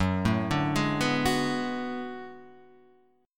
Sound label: B Minor 13th F sharp